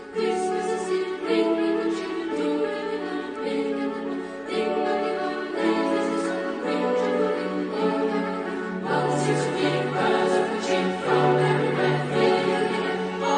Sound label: carol bells choir